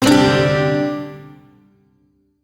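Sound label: Shawzin Pentatonic Major Chord; Music; Warframe; Duviri; Chords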